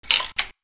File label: Crossbow Load Lock; Weapons